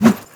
spade light; melee weapons; tfa starwars melee weapons pack

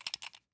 movement pistol; gmod; arccw uc commons attachments

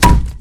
woodbat hit; melee weapons; tfa starwars melee weapons pack

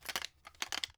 movement shotgun; gmod; arccw uc commons attachments

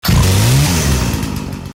chainsaw success start; tfa starwars melee weapons pack

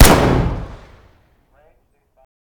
fire dist int pistol; gmod; arccw uc commons attachments

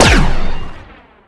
canon; gmod; lvs barc speeder test

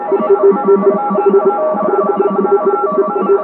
flying; gmod; kingpommes; starwars; hailfire

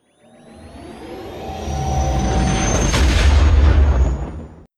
highwake, hyperspace; vanilla s hyperspace tool

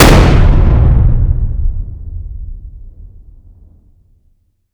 explosion close int; gmod; arccw uc commons attachments

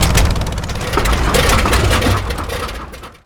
overheat boost; gmod; lvs framework

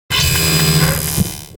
aim; tridroidznnpc

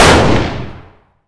fire dist 308 rif int; gmod; arccw uc commons attachments

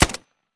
common mech light; gmod; arccw uc commons attachments

